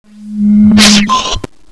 SPLOTCH

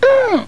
UUOOH

